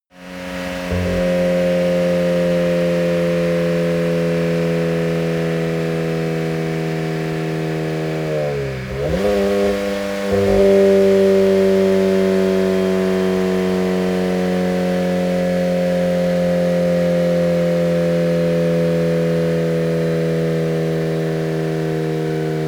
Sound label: car toyota gt86 engine brake